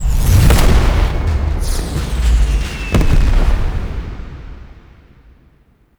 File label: Stage Death Explosion; Warframe; NineteenNinetyNine; Stadium